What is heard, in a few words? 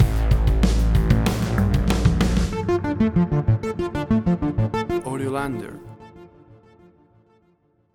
Atmospheric Fuzzy Synth Tension Stinger; AUDIOLANDER